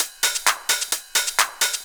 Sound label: hihat 2, 130, drum loops; Ueberschall; Techno Trance Essentials